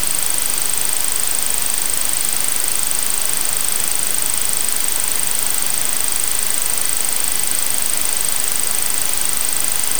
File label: car alarm receiver